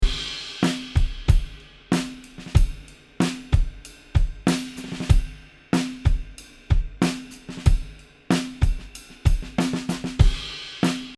DPA 4060 Drums; LR Glyn Jones Mics

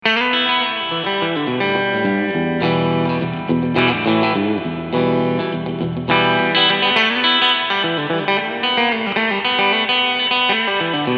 Surfybear Reverb Cleansound

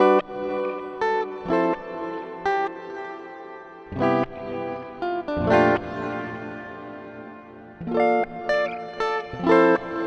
Mooer R7 X2 Reverse

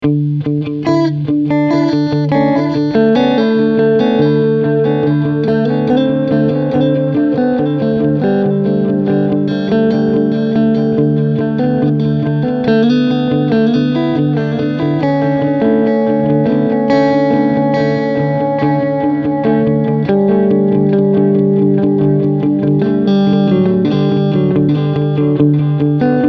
Echo

